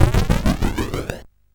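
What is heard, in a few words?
Nebulus, MOS 8580 SID chip music, games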